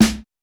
Electro snare; Reference Beat

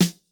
no effect j city pop 1980 snare

